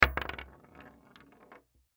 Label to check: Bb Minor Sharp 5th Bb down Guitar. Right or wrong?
Wrong